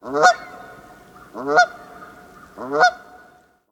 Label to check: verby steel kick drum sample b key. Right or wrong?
Wrong